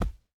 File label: Step Enemy; Game rip; MiSide